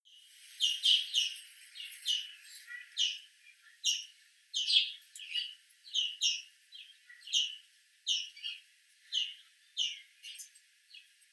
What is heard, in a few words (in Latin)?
Chloris chloris; SETE